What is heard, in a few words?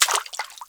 WATER, FOOTSTEPS; 1000fx